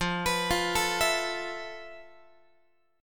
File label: F Major 7th Flat 5th, Guitar; FM7b5; x,13,14,15,13,15; 4A,4E,5A,5D,5G#,6C#; arpeggiated